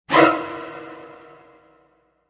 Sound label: Music Short Violin C